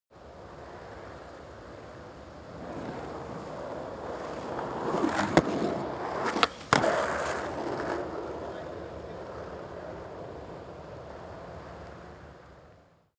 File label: skateboard single drive jump; sport freizeit